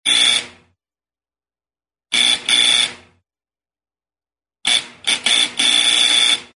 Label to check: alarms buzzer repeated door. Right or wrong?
Right